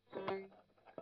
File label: Strum Line